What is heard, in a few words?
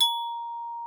TRIS A#5, VIBES; Xsample VOL-4